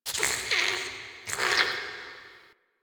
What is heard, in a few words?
interior squish gurgle; Ambience; Misc Indoor; DE; Warframe; Infested Eris